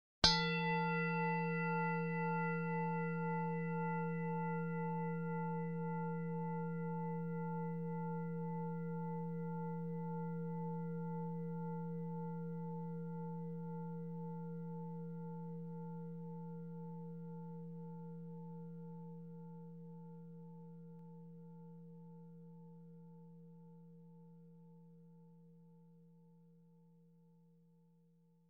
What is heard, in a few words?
bell